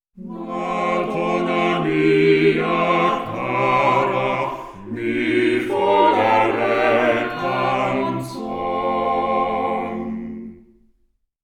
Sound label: quartet